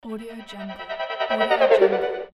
pulsating melodic intro effect

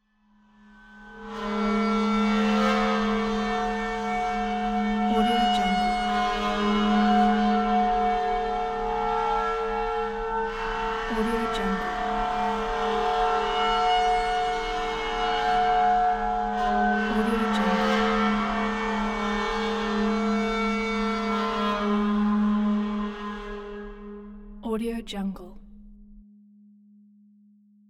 iron atmosphere; teldrassil

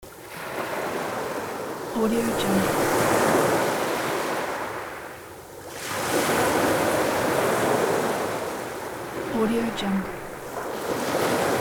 sea waves loop

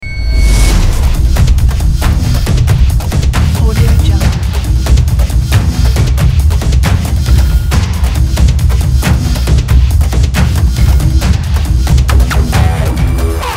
action chase

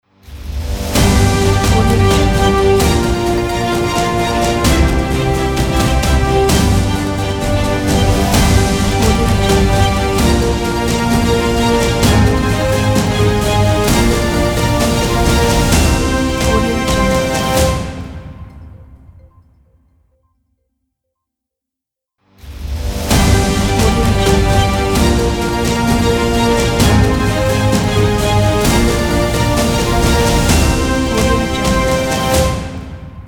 tv news show opener ident

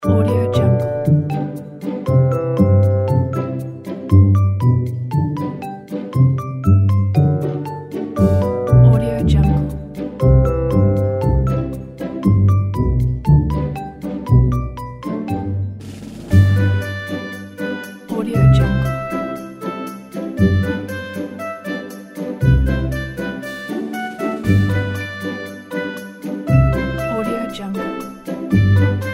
merry childrens relaxed training

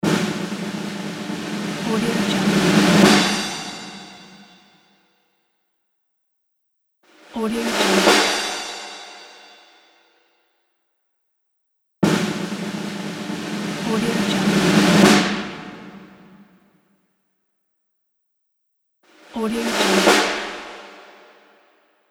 suspense drum rolls

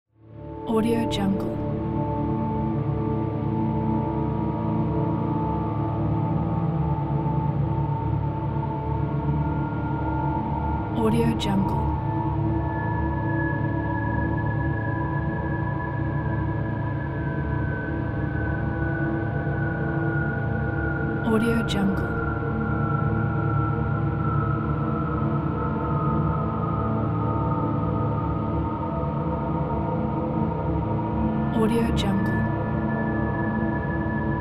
dark suspense ambience

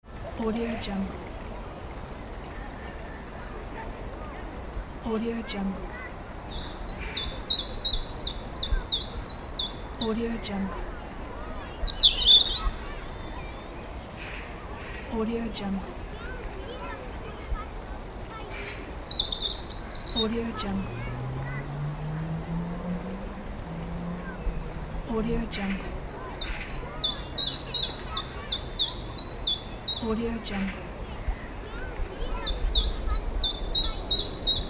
park ambience

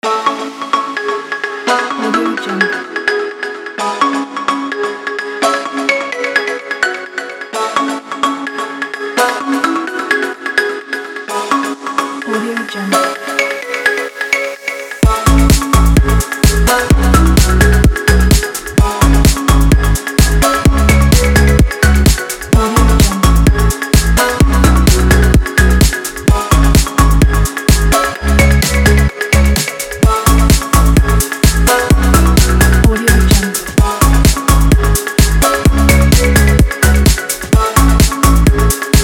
deep upbeat house

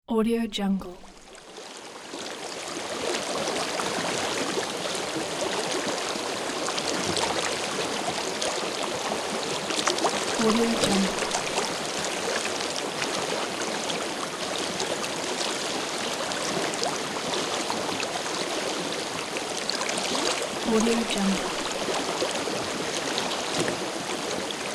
calm river flow

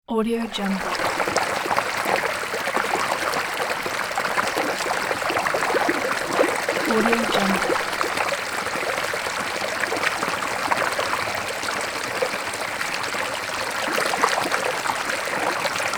mountain stream

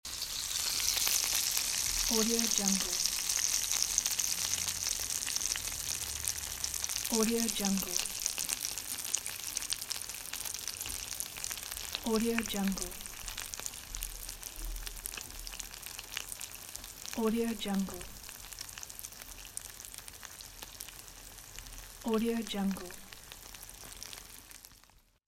fried fish

